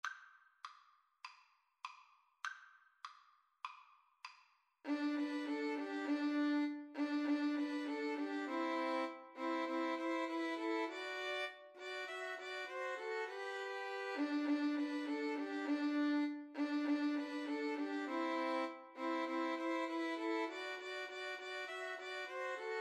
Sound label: jingle bells 3 VLN